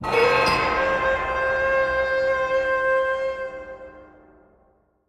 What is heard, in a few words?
pvp warning horde